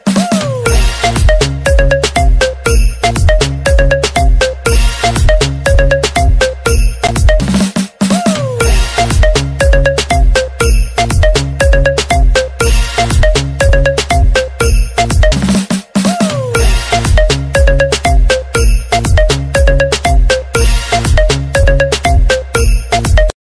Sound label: instrumental bollywood ringtone